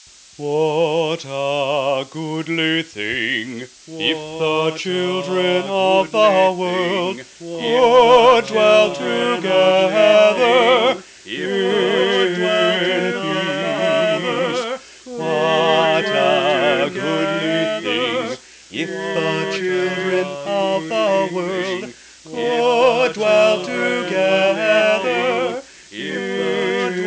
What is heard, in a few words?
peace round round